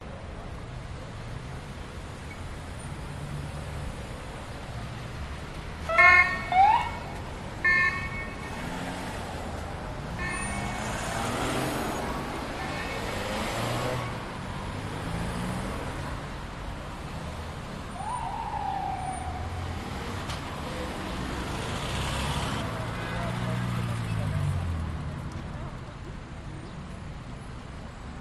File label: Traffic Sound Police Sirens Appear Shortly Cars Passing By; Budapest Hungary